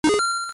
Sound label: Slots Coin; Pokemon Essentials; Pokemon Iberia V1.03